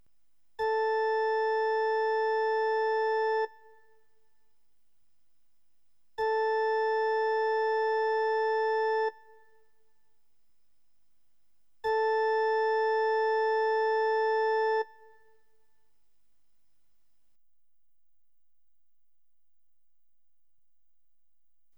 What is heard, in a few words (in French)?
Note vocale La; blokfluitstarter